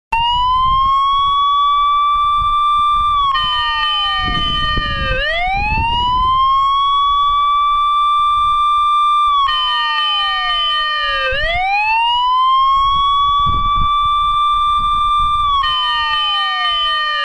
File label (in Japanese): sairen